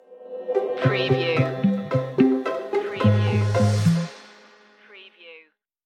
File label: charmed stinger